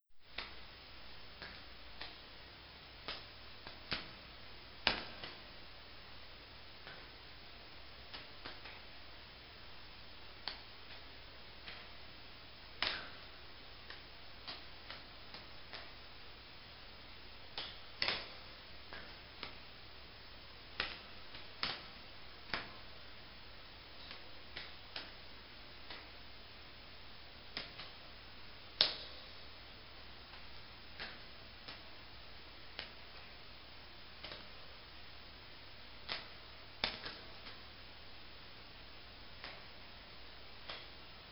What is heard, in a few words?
Cave Drip; ambiant